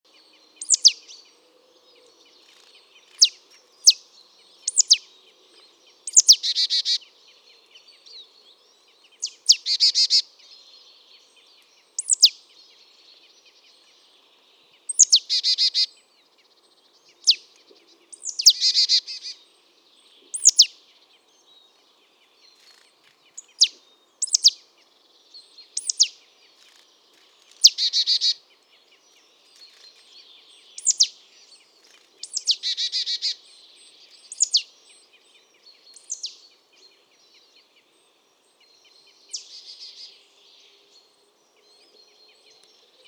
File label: Poecile palustris; SETE